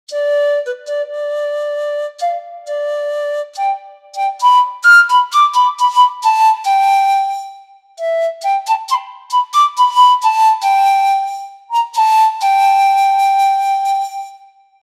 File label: pan flute and quena, andean